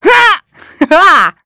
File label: taunt, female; quake2; redux